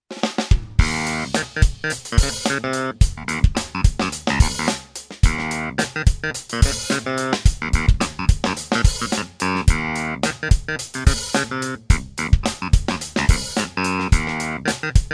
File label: Clavi